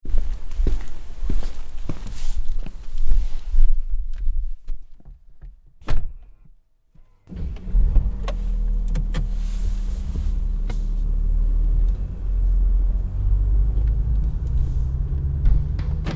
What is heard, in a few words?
Limo Atmo